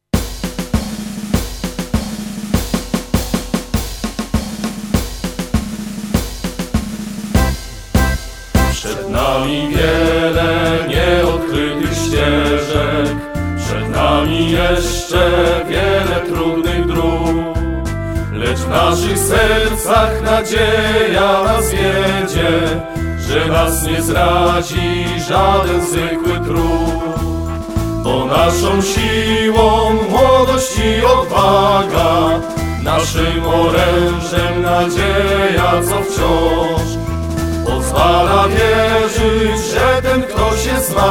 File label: hymn